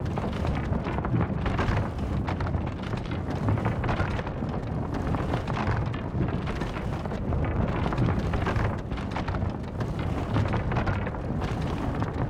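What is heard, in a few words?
siege movement loop; Onager, Ballista, Ram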